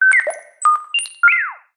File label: science fiction computer burst noise beep